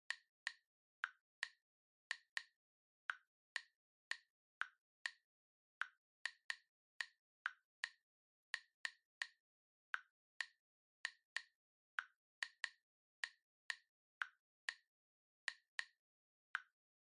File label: Smartphone Typing Speaker Slow